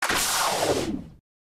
Raygun; Criminality

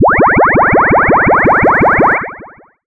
Tractor Beam

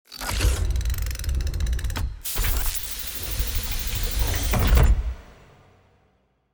Moving Pillar; PCBS; Aorus

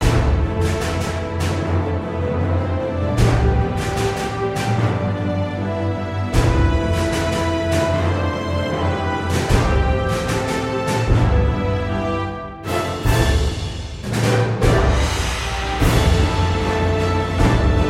bgm mecha battle boss; kikai battle boss 2 dirac sea upper mastering

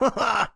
Victim Miss Male; Friday the 13th: Killer Puzzle

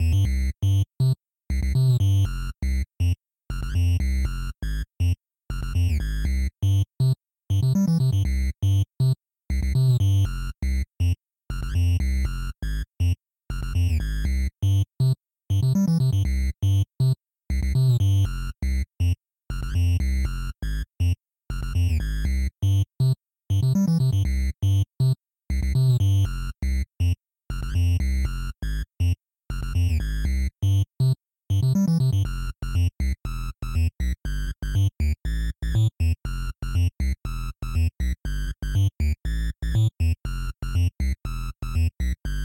Bass; Bunkers

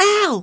HIT BEAR

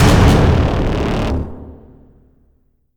Fail

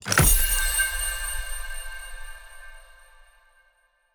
UI Achievement; A Year of Rain

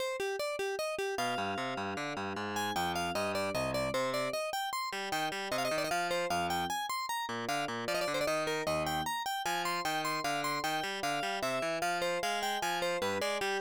Baroque; Digan no Maseki; PC-9801

